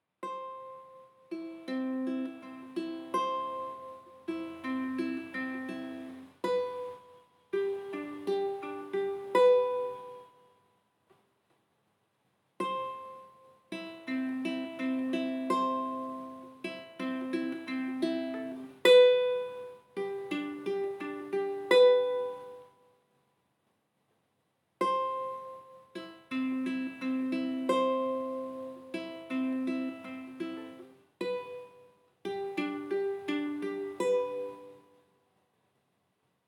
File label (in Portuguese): acalanto instrumental